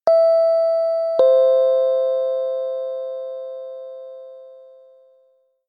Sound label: door chime